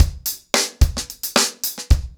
Hip Hop 110BPM, acoustic drums drumloops; The Roots Drumloops; Hail Hail Hip Hop

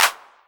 808 Clap; Novation Drumstation

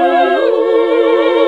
SING, CLASSIC VOX; Best Service; Hallelujah

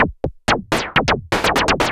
SH101 Clocked LFO Rhythm (125BPM)

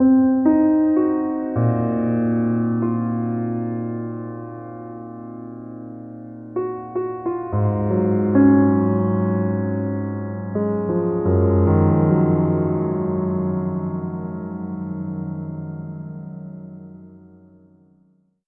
Piano; Sonic Foundry (Sony Creative Software); Slow Silhouette

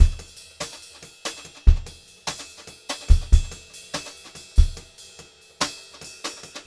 144 vari, Brushed Drum Loops; USB Soundscan vol.39; AKAI

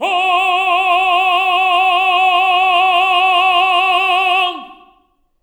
TENOR F#4, Voices; Spectrasonics; Symphony of Voices

